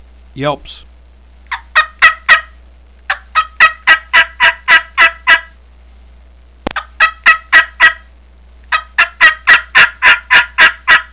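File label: yelps, turkey call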